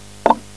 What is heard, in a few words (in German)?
kluck